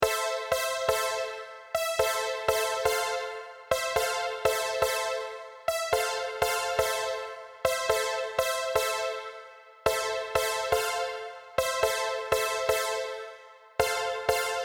Chords

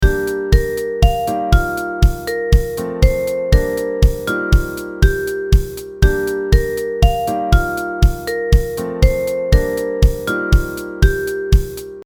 Angel Echoes Instruments

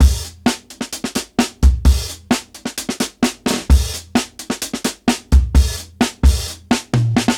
Break Techno Tempo 130 bpm

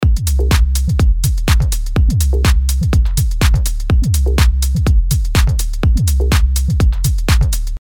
Full Loop

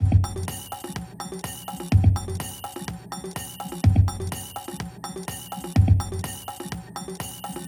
EDM

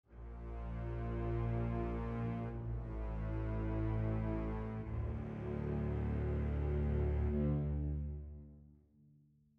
Str Samples Basses

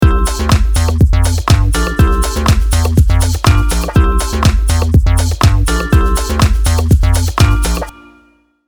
4 Bars Mixolydian

